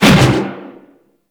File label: Fist Whoosh; Archwing; Melee; Grn Arch Regor Fists